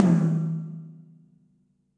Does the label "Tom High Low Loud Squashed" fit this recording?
Yes